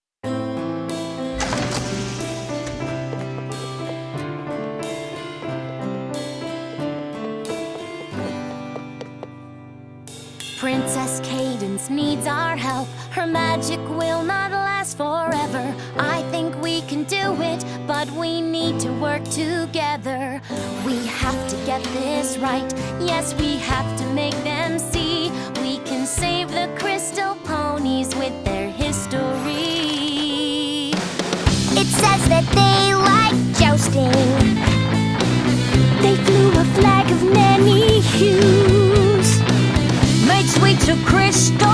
Flügelhorn Rock Cover

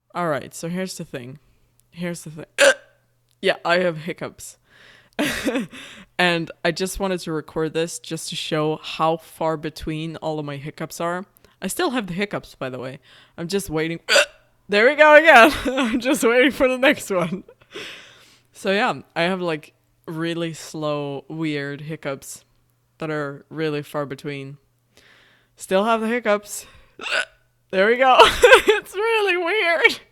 Hiccups

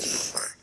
Suck

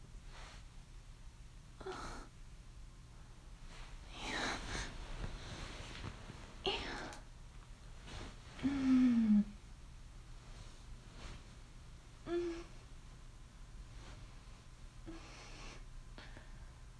[whispers][whimpers][bedsheet rustli; Please Spank Me; [daddy mentions]; [bedsheet rustling noise]

